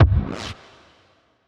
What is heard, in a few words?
Ripper Kick